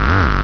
Acid FM Acid Bass close; NeoN-B2.0

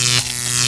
battery; Spider-man Cartoon Maker; brushes

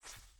mouse move

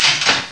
Kachunk; 3D Ultra Pinball Demo

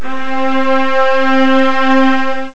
strs, strings

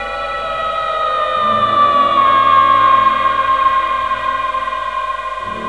Ambience; Sonic Foundry

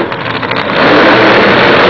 Top Fuel Program error; VoitureCourse

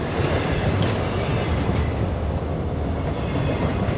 train car loop; 11,025 sample rate; Transcription: So; SpecOps2

